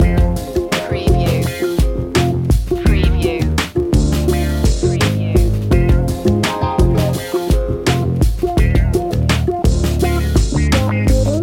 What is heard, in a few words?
up in smoke loop